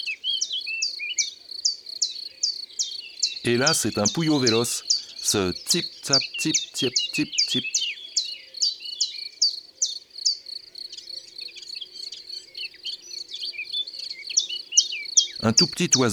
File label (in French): Pouillot veloce; ABC de la nature